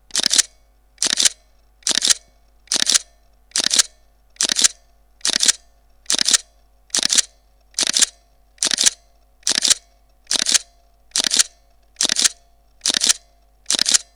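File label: Pentax K200D continuous low; JPEG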